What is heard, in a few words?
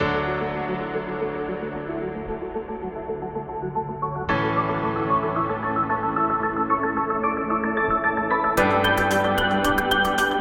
Atmospheric; corporate music vol 20